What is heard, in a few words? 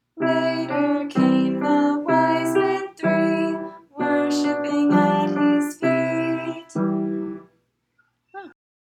recitative